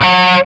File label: G4 SOLDANO ROCK, Guitars; Spectrasonics; Hans Zimmer Guitars VOL-1